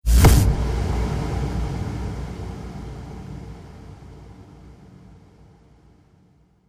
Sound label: Trailer Hit; Maximus Epicus; Trailer Sound Effects